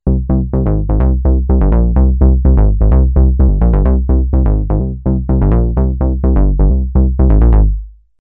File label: altair retro bass